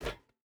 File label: footstep burlap metal run; Rust